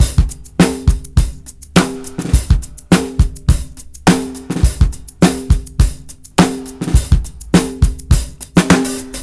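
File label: drum, Breakbeat; WANKER 104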